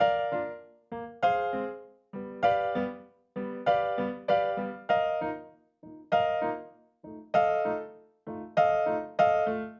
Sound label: Acoustic Piano